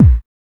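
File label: HSE-BD, house bass drum; X-Static Goldmine 1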